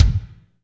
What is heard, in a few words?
BD, DR BD; Best Service Gigapack 2